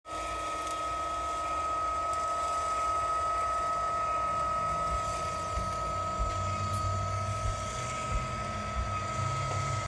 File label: Litter Robot 3 rotation sound